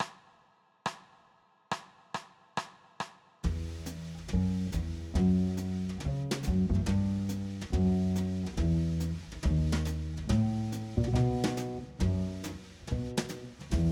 E Minor Blues No Piano Soprano; applied jazz ear training; Practice Perfect

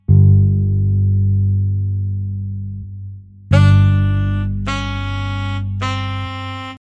D Db C G Drone; trichord ear training; 3 note combination singing exercises

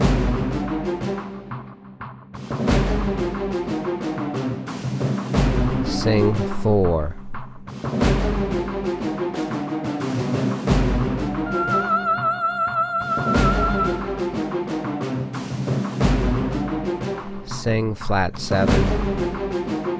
Soprano Key of C; movie score ear training

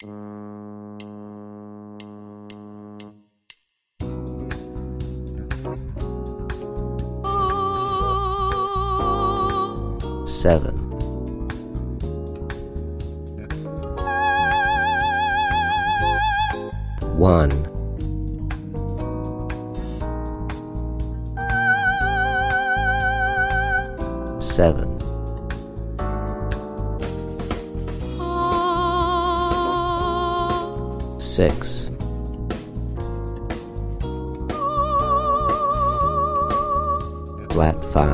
Ab Blues Slash Chords Funk; slash chord ear training; Practice Perfect

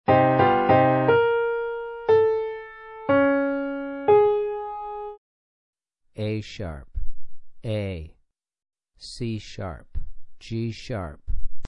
Piano Min a#3 a3 c#3 g#3; ear training four note melodic

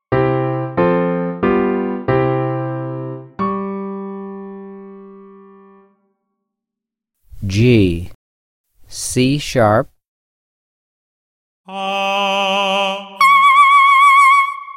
G2 C#5 Grandeur Piano 2 Note Singing; Level Two “Singing” Example; ear training two note

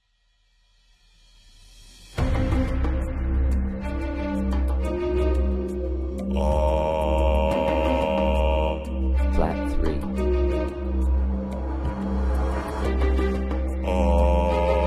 Key of C One Note; ET Short; movie score ear training

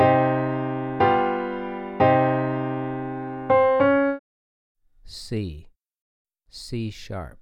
2 Note Mel Min c3 c#3, piano; ear training

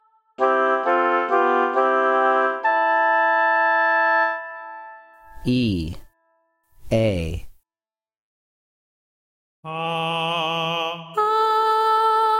E3 A4 Oboe 2 Note Singing; Level Three “Singing” Example; ear training two note

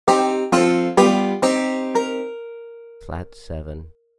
Flat7 Banjo Minor Degree Name; ear training instrumental color

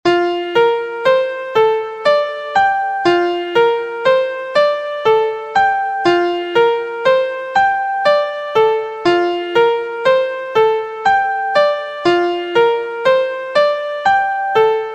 2nd Inversion Key Bb; harmonic and melodic equivalence

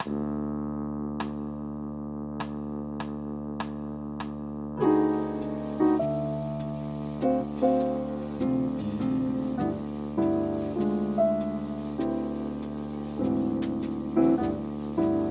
D Major Blues Piano and Drone Soprano; Applied Jazz Ear Training; Practice Perfect; 12 Bar Blues Ear Training in Real Time

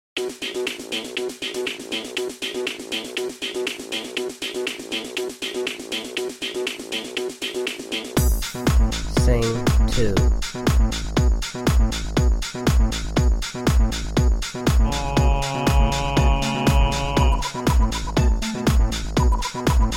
Tenor Key of B; Dance and Techno Music Ear Training; Practice Perfect

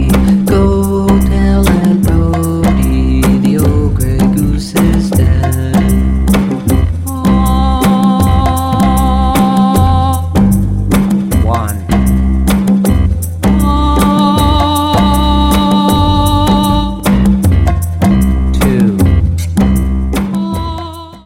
Aunt Rhody Guess Numbers; Ear Training For Children

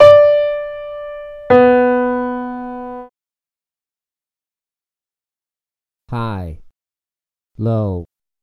high low piano d4 b2; total beginner ear training

